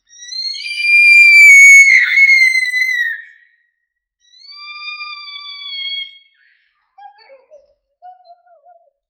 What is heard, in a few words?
thief snatching and, snatch